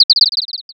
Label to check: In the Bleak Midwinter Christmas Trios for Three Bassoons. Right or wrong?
Wrong